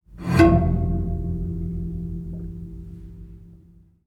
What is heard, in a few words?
piano string pick resonant muted, upright piano soviet